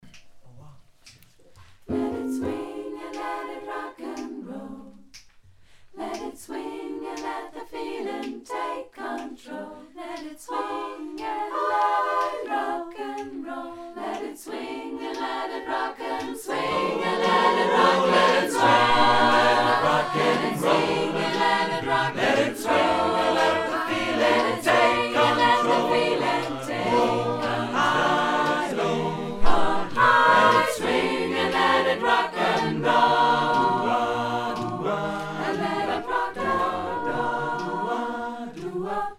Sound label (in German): Mix nur mit Panning, popchor